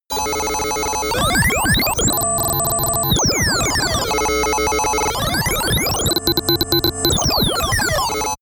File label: digital error sequence high, sciene fiction